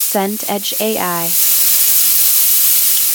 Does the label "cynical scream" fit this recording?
No